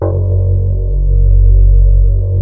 STRIA, Twisted Textures; STRYNGI

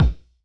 Bonk Low Kick; Airwindows

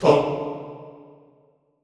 TONG D2, Classical Choir; Best Service; Extended Classical Choir; SHORT TONG

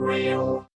DJX 2B The Real Bass robot, Yamaha

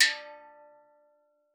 D3, STEELDRUMS; Xsample VOL-4

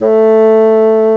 BASSOON, REEDS; CMI Sound Library Rev 1.3

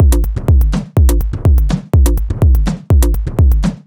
124bpm, House Drumloops; 90 s Sample CDs; Zero-G House Fabrik Drumloops; ZG Drumloops

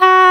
oboe dry; Roland L-CDX-03 Disk 1; Oboe 4-8

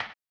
HAMBONE; ILIO; Vocal Planet; Blues & Soul

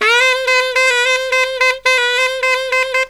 SAX, Killer Brass Riffs

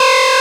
Synths Root C

